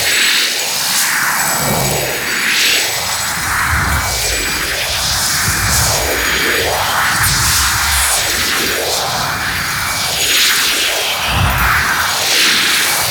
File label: Paint Trail Loop; Gameplay; Warframe; Shadowgrapher